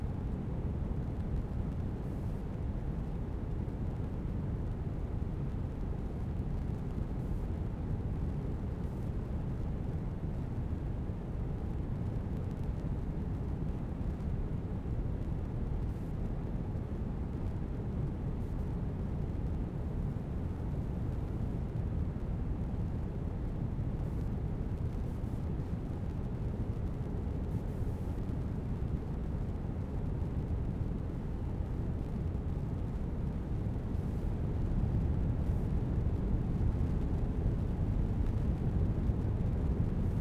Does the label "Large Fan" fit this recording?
Yes